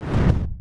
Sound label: spark stand